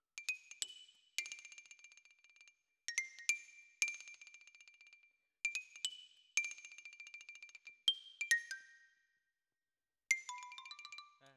Xylo Close

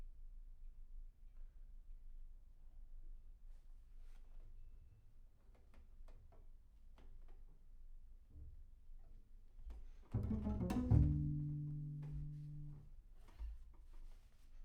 Bass Room

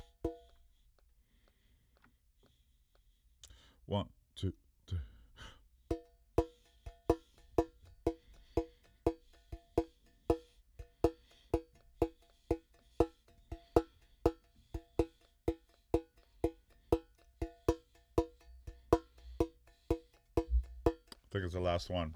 Cowbell Loop

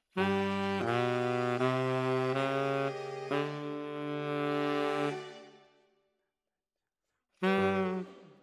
Tenor, RNR for piano

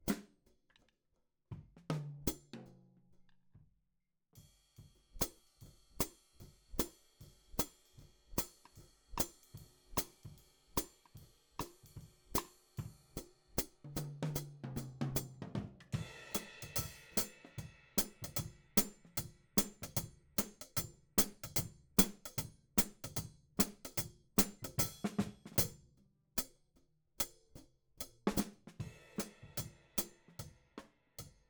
Hat

